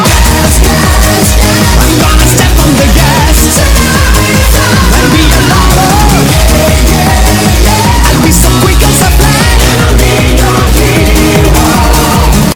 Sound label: loud